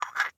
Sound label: Household Stone Container Remove Lid Knick Small Knack Box; Frogsong